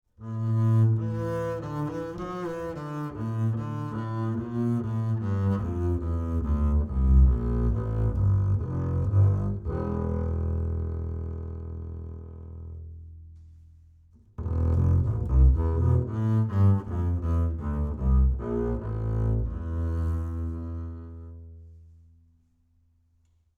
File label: Ac Bass 25cm L Bowed Low Mid